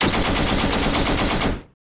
heavy machine gun

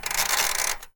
oven dial

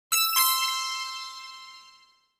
correct

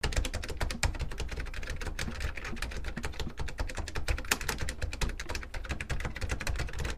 keyboard high speed

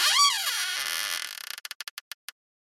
door old open

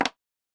key put

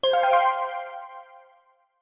decision, button

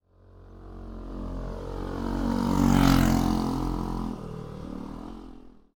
bike pass

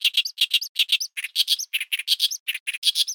acrocephalus arundinaceus twitter

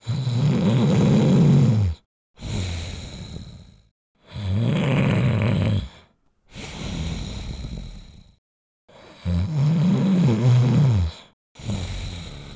snore

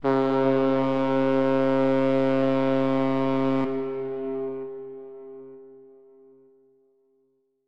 ship big whistle

